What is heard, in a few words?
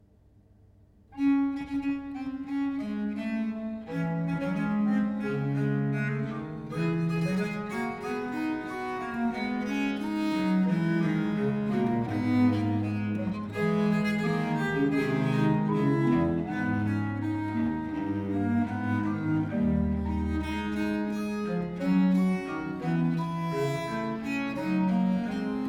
duo for two bass viols, in d major minor; early music